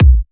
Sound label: vee bassdrum